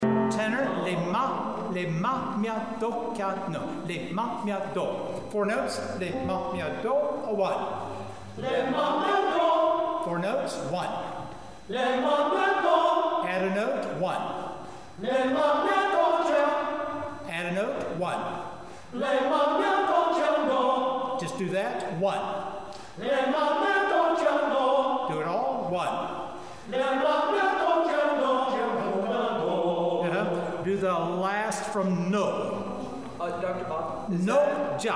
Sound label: Bell Chorus T drill; rehearsal files